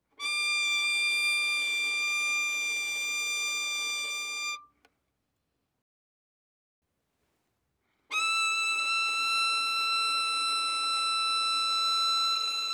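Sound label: Violin arco fortissimo on A string D6 G6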